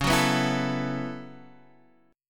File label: B 7th Suspended 2nd Suspended 4th C sharp